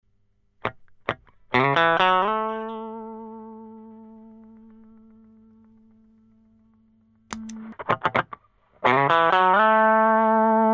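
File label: Without / With Sardine Can (Compressor + Rectifier + Color Brown), guitar; Celmo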